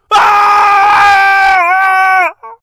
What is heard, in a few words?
scream; teamcity